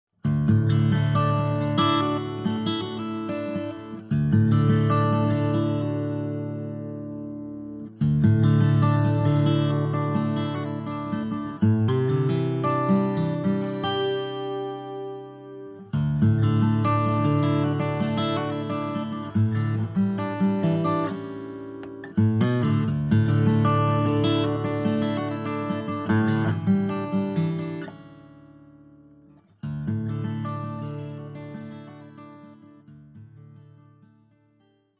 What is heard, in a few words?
Guitar